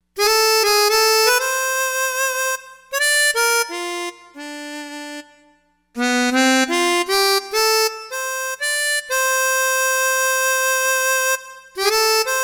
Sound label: Harmonica